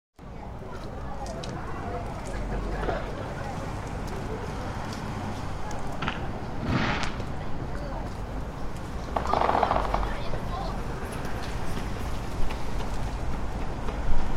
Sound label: Street Fireworks, Ambiance in English street on November the 5th with distant fireworks, peopl; Ambiance in English street on November the 5th with distant fireworks, people walking dogs barking.